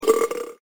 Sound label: burp